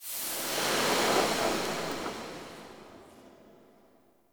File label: Hand Projectile Smoke, Weapons; Warframe; Duviri